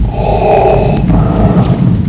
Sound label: breathe